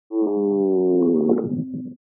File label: Human stomach growling rumbling hungry